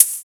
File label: Synth Hat Open